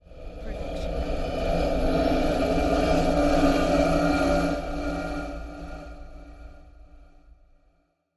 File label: horror dead arise swell